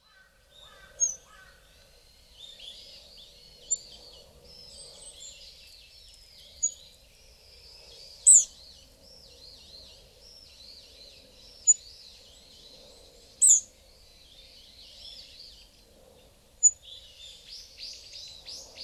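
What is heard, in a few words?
Olive-backed Pipit; binzui; hyogo; koe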